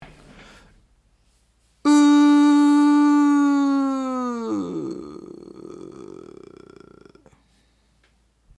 音程音量下降からのエッジ

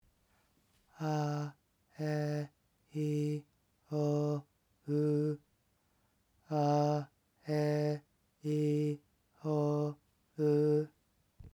息漏れ母音

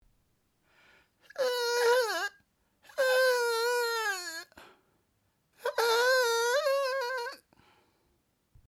クソ裏声

